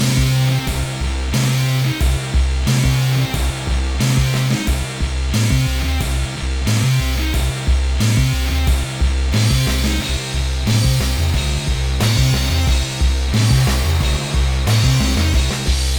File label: Celestial Killer (Back-Up Loop); Stage5